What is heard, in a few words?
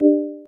magic